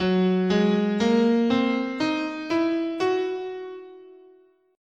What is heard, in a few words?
F sharp Prometheus, scale